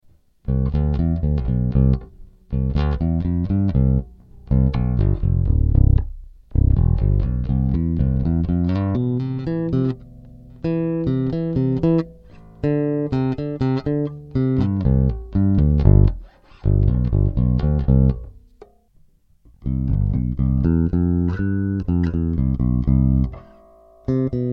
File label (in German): alle pickups